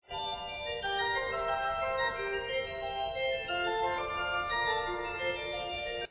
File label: fugue d minor